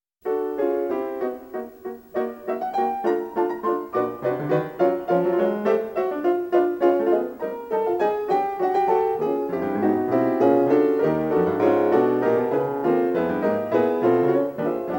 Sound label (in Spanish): Sonata para dos pianos